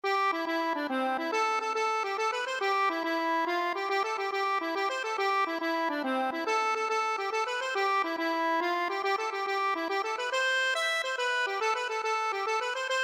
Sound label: accordion